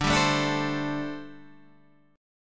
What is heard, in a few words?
D 7th Suspended 4th, down